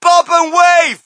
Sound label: crowd, shout; Mike Tyson Heavyweight Boxing